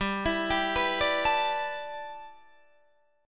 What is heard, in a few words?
G 6th Add 9th, Guitar, Open D